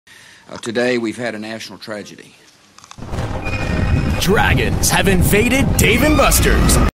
dnb

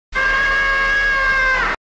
scream

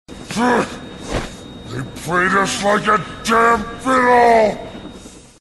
fiddle; reversevape

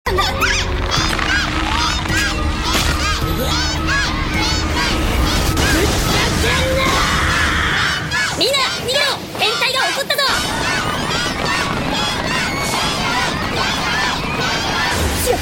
alert

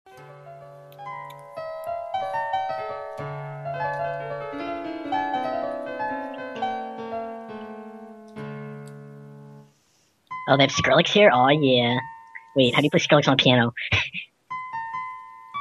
piano

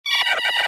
star select reverse

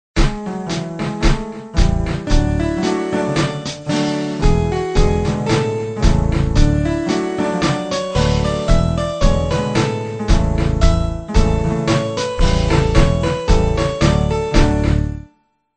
right backing

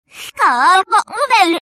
clock, reverse

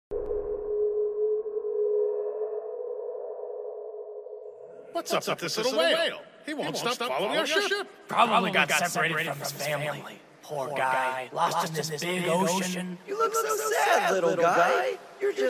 Casio

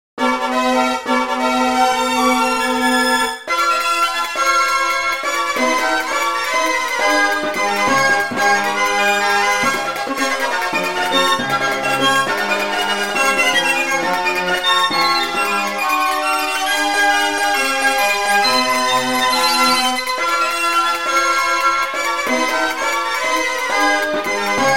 orchestral

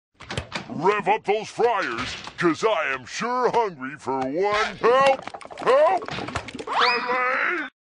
fryers; reversevape

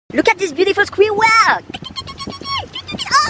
squirrel

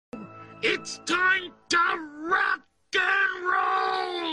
rock and roll

